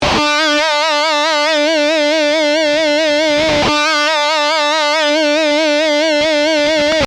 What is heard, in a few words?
Vibrato Half Step, guitar